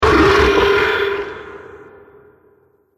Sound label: Scream Huge Monster, Monster Sound Effects; horror